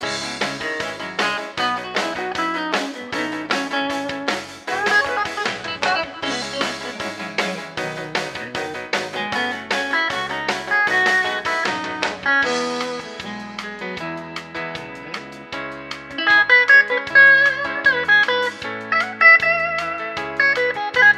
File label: Jukebox Rock